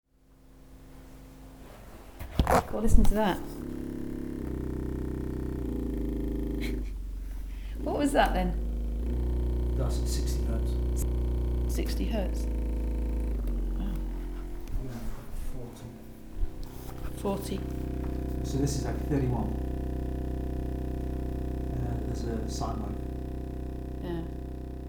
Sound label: low hz polystyrene